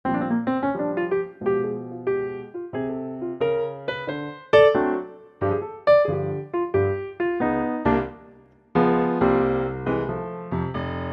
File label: F piano; key - F - vocal range - F to G; Lightly swinging piano arrangement of this classic standard